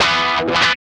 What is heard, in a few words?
GUI, 100 BPM